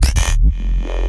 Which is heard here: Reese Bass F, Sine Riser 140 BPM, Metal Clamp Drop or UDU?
Reese Bass F